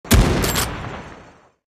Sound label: fire